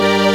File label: Clear Level The Jungle, SNES; Corn Buster